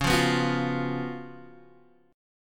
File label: Db 11th Db, Guitar, DADGAD